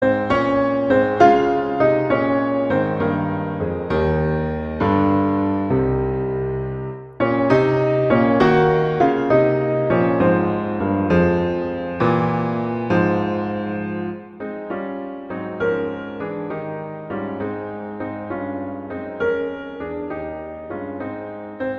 Larghetto; Schumann; Albumblatter

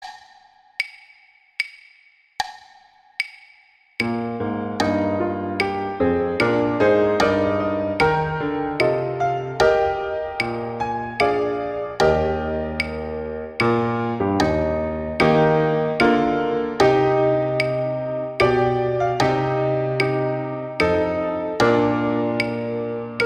The Star Spangled Banner, sax; USA Anthem

